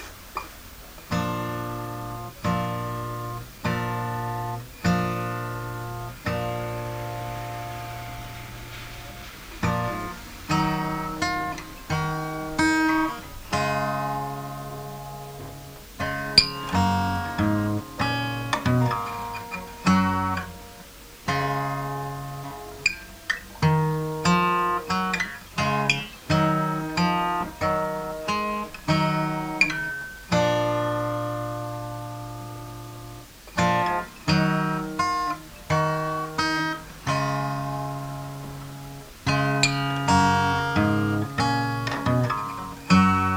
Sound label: Baroque